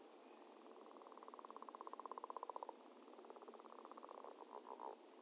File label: Leopard Frog